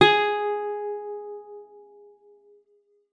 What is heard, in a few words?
G#4, GUITAR; Xsample VOL-2